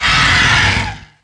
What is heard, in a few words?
hieracosphinx attack; DAoC; Toa; Gamestar